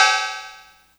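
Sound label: side ride, lo-fi; Loafa; Ableton Foundation FreePacks; Wicked Kits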